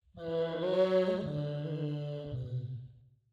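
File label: Deep Vocal, B minor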